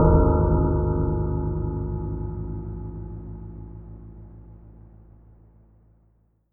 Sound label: carry; devour